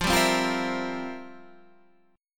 F Major 7th Suspended 2nd Suspended 4th, Guitar, Standard; FM7sus2sus4 strummed; JGuitar